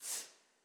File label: CHO C, CHO Voiceless; Sound & Vision; Gigapack I CD 2; CHO Vibr.+NoVoic